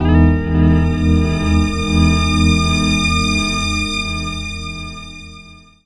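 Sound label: Guitar & String; Jungle; Riffs, Arps & Hits; Blu Mar Ten; Jungle - 1989 to 1999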